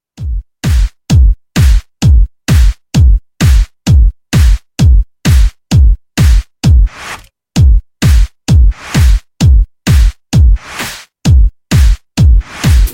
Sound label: Bass